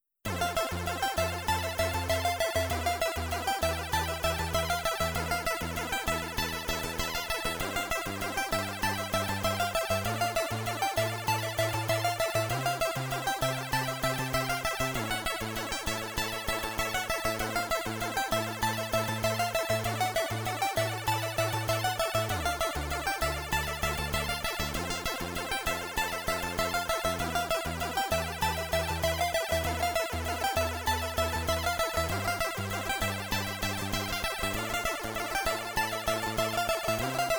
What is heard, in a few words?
arcade swing